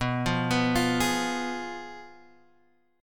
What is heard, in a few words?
F Diminished B